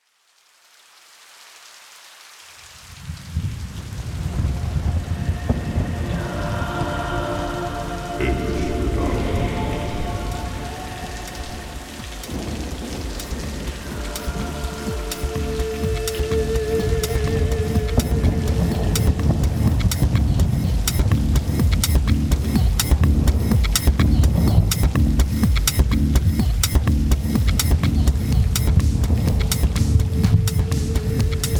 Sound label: Deep Ocean Rain Instrumental